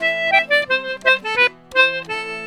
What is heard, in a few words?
C3 L, Celtic Flavours; USB Soundscan vol.42; AKAI